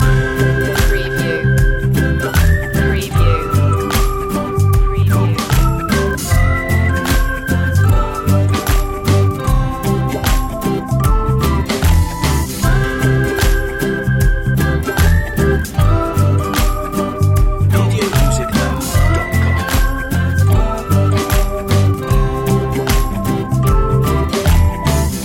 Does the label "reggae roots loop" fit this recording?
Yes